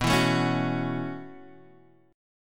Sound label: B 9th Suspended 4th B down Guitar Open G